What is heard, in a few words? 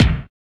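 bass drum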